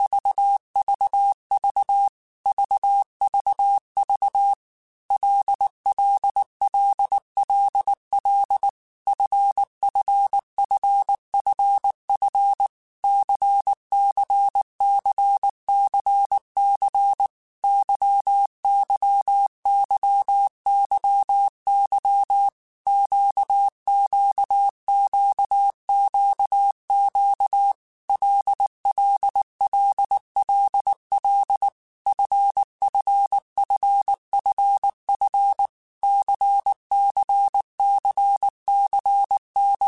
LFCYQ, Morse Code; CW Code Groups; Basic Letter Groups; HamRadio; Basic Sets

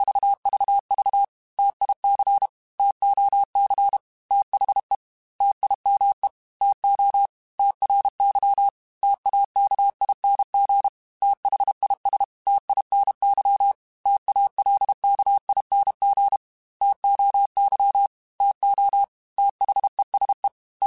T Runs, Morse Code; CW Code Groups; Mixed; Single T or H; HamRadio; Prefixes

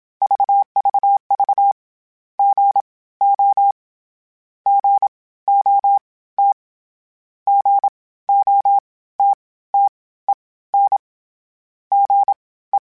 Morse Code, CW Code Groups; Mixed

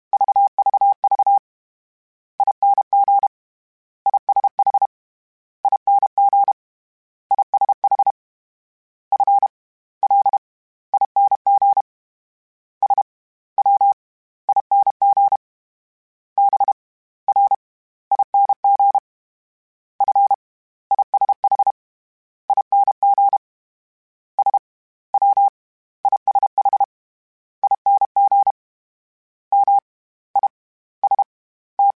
Morse Code, CW Code Groups; Mixed

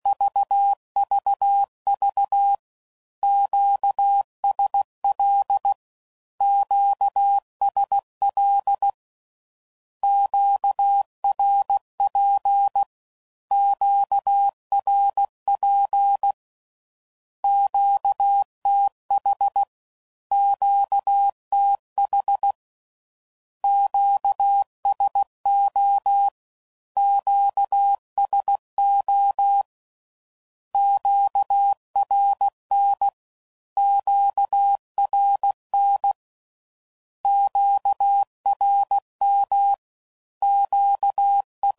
Q Calls Twice, CW Code Groups 15WPM; Morse Code

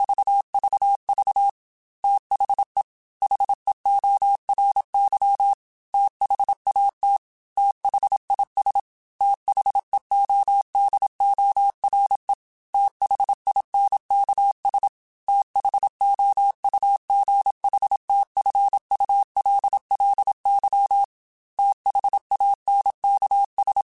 TH Runs, Morse Code; HamRadio; Prefixes

